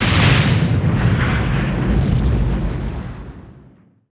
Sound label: BIG EX, MORE EXPL; Total Control - Action Cutz